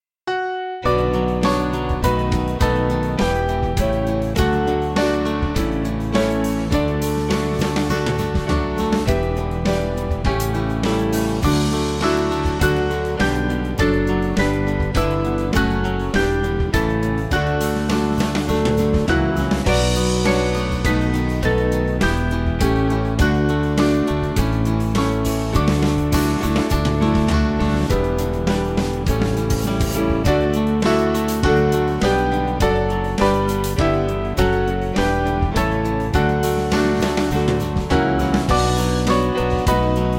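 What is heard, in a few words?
Band, Music Backing Files for Churches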